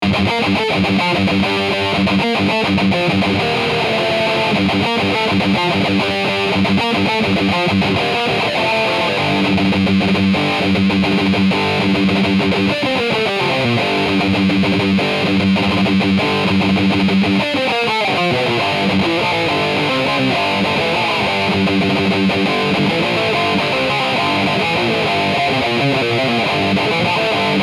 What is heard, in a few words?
distortion, electric balalajka; berezovsky